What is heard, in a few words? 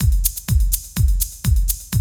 Loop, Roland; Sound & Vision - Gigapack I